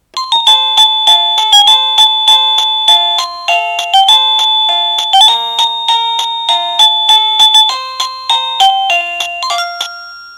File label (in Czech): melodie